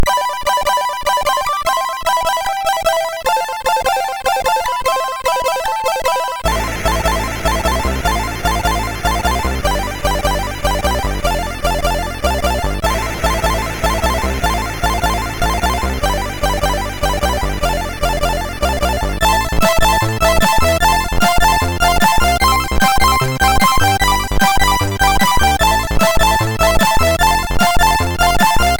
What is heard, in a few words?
Short tune, MOS6581R3; hvsc; Mueller Manuel